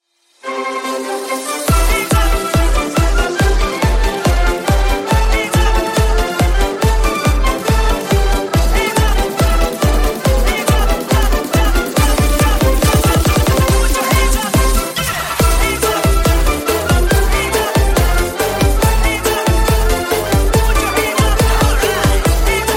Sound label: edm